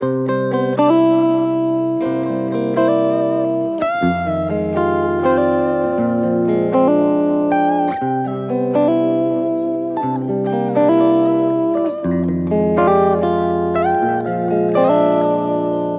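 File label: 90 electric guitar loop bedroom C major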